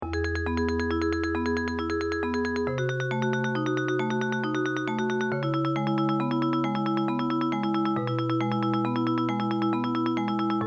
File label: tremolo pieces gentle breeze